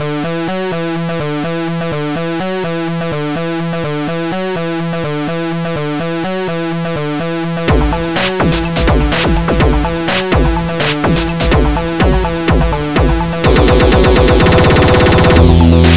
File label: Monotron